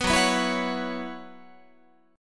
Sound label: F sharp 7th Suspended 4th, Guitar; strummed; F#7sus4 Chord